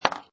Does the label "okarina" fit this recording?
No